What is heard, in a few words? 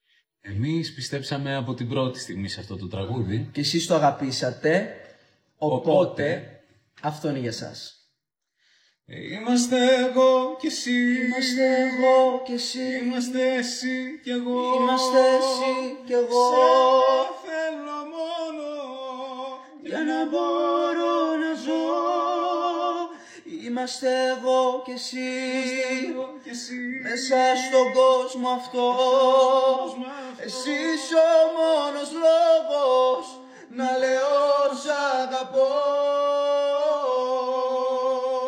vocals